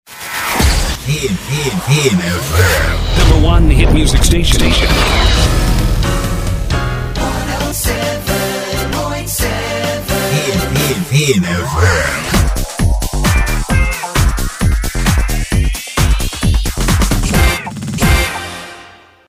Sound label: Jingle Action; VIN FM